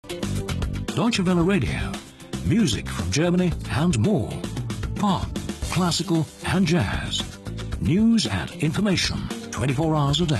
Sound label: drm